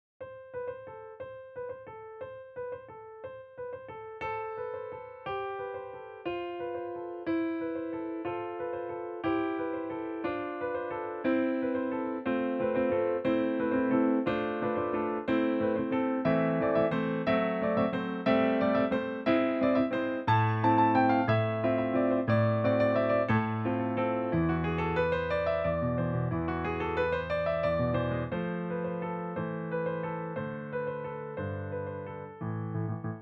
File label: ukrainian bell carol; salvationarmy; Accompaniment Tracks